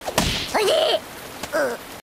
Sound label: SNARE; 音MAD; 少女终末旅行; 소녀 종말 여행 음원팩; Syaro Sample